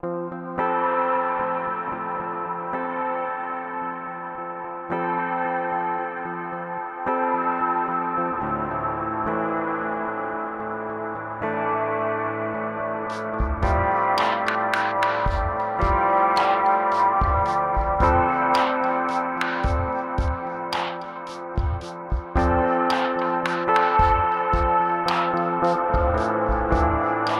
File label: Strymon NightSky